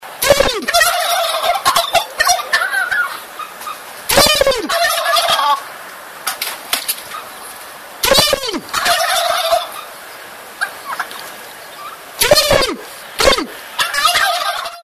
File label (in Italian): Verso tacchini